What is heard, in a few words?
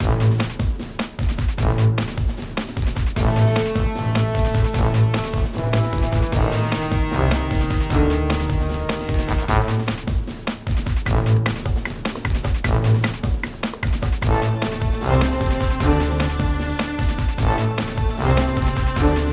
musicloop; SpongeBob Patty Pursuit; rockbottom